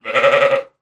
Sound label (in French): Mouton